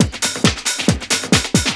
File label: loop; SoundFactory; SMPMANIA